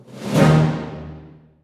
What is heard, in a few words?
flag stolen; Call of Duty Modern Warfare